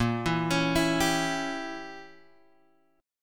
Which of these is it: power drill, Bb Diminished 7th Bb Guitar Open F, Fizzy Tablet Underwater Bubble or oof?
Bb Diminished 7th Bb Guitar Open F